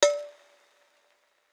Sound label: perc; eddyflux